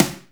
Snare, Akai MPC500

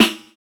Snr; Roland MV8800

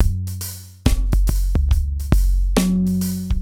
Low Loop, Drums & Percussion, Electronic; Sonic Foundry (Sony Creative Software) - Electronic Point Blank

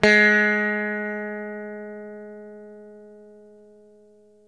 SITAR G#2, CORAL SITAR; East West; Steve Stevens Guitar Samples Collection

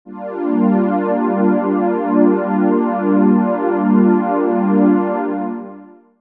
Digi Pads; Jungle Frenzy 1

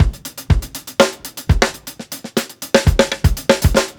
120 HALF TIME BREAK, DRUMS LOOPS; BASEMENT DRUMS VOL 2

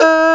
Zhonghu E3; Planet Earth; Digital Sound Factory Planet Earth

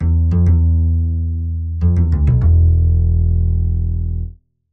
Doppel Bass, 100bpm; Loops n Lines

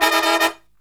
Killer Brass Riffs; USB Soundscan vol.29 - Killer Brass Riffs; AKAI

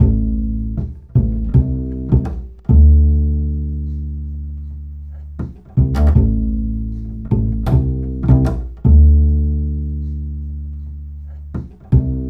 Acoustic Bass

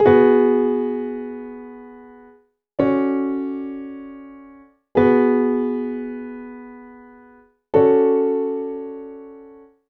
Piano, cinematic funk; Sonic Foundry (Sony Creative Software); Cinematic Funk Urban Tone Poems

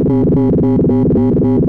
Moog D#1, Synthesizer; Hollywood Edge; Giorgio Moroder Rare Synthesizer Collection; MLTI.MOOG

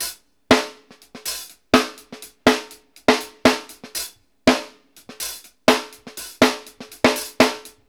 HAT SNARE; Sampleheads; New York City Drumworks VOL-1; KD JBEAT 120

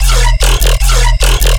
Combinated, Bass Loops, 150, E; Virtual Riot

